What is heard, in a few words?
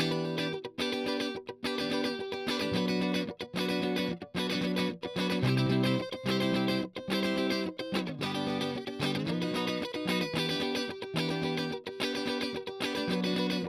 Strum